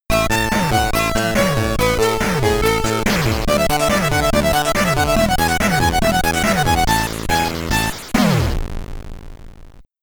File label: Victory! (SID Stereo); Rasputin; Commodore 64